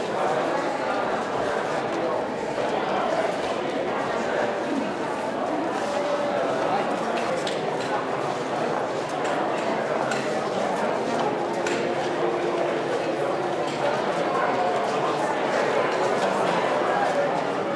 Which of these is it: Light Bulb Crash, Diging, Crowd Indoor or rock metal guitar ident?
Crowd Indoor